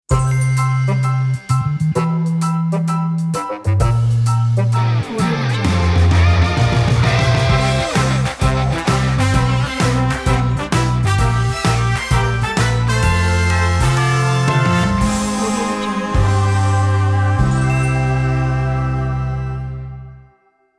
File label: wedding ring ident